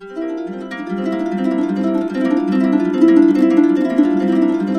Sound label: BISBI, Complete Harps; USB Soundscan vol.62; AKAI